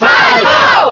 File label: Cheer Italian; SSBB